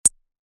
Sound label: tick